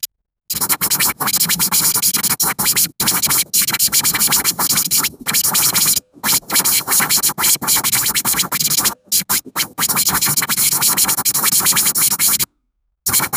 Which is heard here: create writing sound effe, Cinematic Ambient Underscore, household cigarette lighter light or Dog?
create writing sound effe